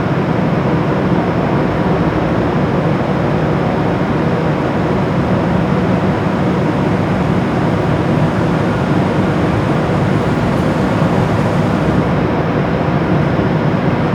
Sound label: airport tarmac dull